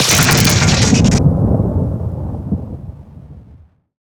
Banshee Missile Flesh Impact; Evolve; Elder Kraken

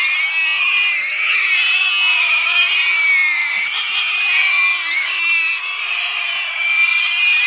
Ability Spider Trap Persue Loop; Lurker; Evolve; Gorgon

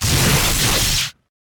Melee Swipe; Grounder; Evolve; Kraken

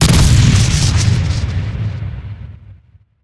Banshee Missile Impact; Evolve; Elder Kraken

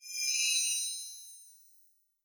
Monster Shield Bar; Evolve

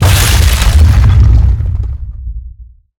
Mortar Cannon Impact; Evolve; Behemoth

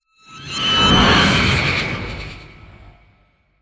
Spirit Walk Start Tell; Lurker; Evolve; Gorgon

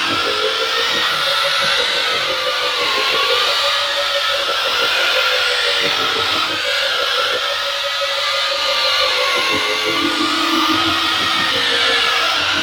Banshee Missile Homing Loop; Evolve; Elder Kraken

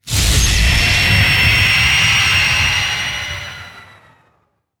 Banshee Missile Homing Start; Evolve; Elder Kraken

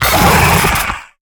Pain Light; Evolve; Gorgon; Lurker

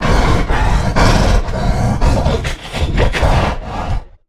Stealth Breath Low Health; Evolve; Goliath

